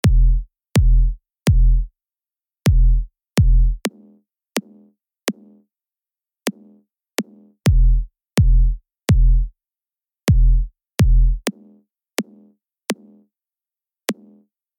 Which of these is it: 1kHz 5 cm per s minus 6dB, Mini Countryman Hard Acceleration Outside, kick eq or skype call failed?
kick eq